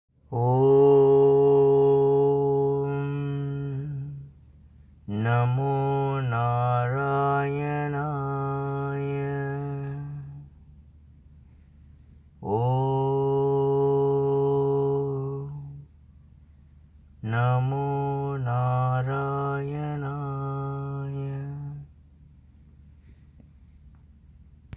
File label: Om Namo Narayanaye